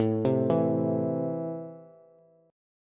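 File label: A Minor, Bass, chord strum; x,12,10,9; JGuitar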